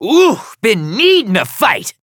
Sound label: Taking Damage; paladins; Demon